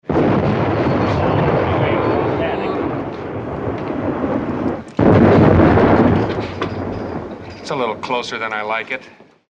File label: explosions